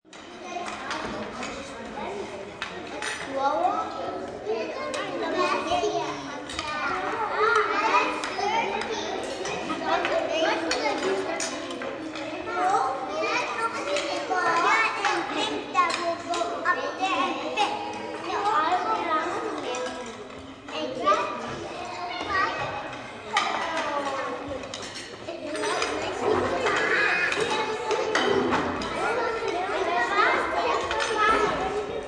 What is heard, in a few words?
Ambience Lunchrm, Lunchroom/Crafts Room, 15-20 children, indoor, sounds of utensils / cutlery; Cafeteria; kids chatting, talking, eating, playing, laughing; VoiceBox